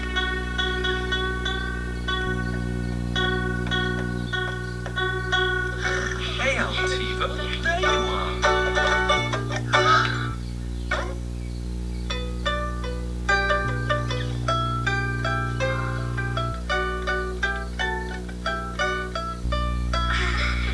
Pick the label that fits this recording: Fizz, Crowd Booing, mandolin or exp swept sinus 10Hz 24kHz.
mandolin